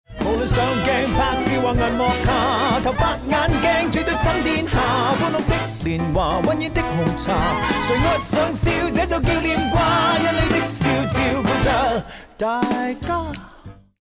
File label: won round